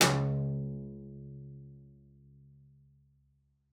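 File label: CELLO spiccato C#2; 90 s Sample CDs; Xsample VOL-5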